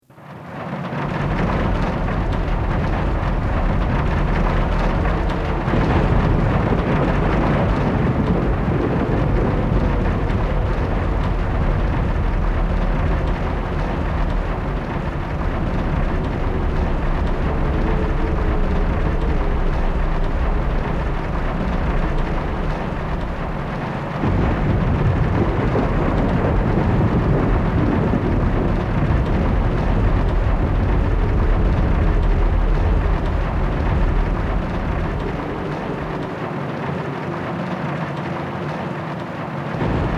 Inside General Scales Galleon Ambience; starfox

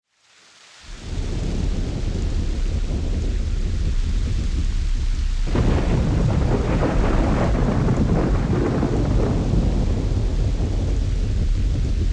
Rainy Thunderstorm Ambience; starfox

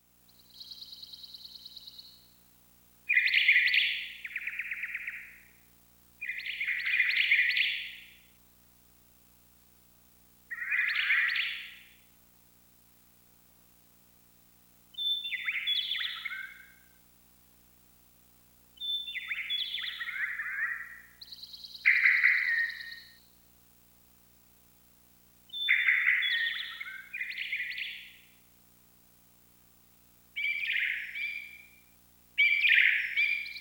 Bird Chirp Ambience; starfox

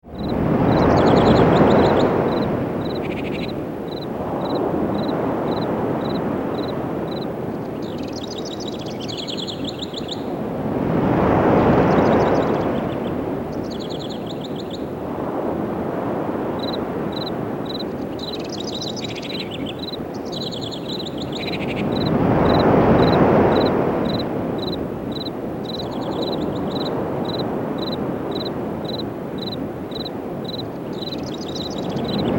Waves and Crickets Ambience; starfox

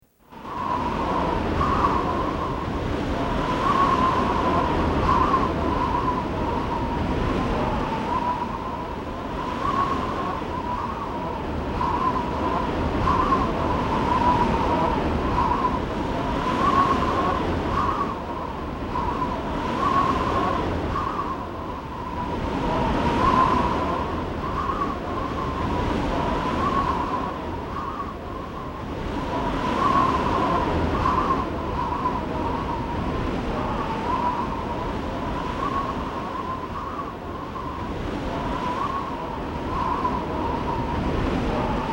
Strong Wind Ambience; starfox

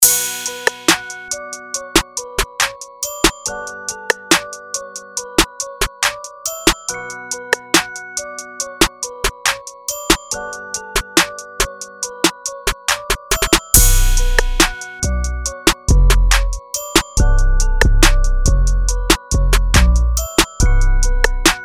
trap pack

